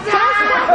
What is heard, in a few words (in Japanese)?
ｼｬｱｱｱｱｱｱｱｱｱｱ